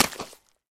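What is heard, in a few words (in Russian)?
неожиданно наступить сапогом в грязь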